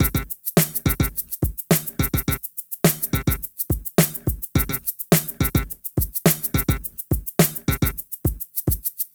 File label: Club Tip, R&B Drums